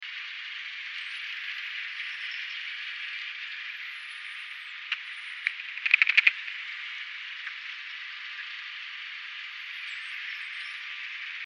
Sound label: Dolphins